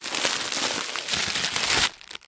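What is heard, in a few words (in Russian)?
нетерпеливое шуршание открытой пачкой чипсов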